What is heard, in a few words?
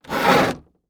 Household Wood Door Slide